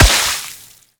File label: Balloon Splash